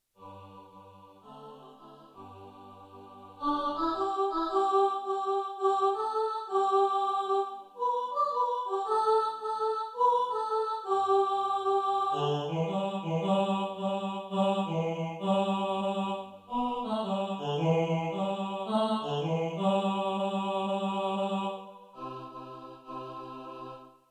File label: hope solos, choir